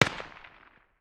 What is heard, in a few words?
far distance, distant 7.62; tacz-tweak-pack; taczmechanics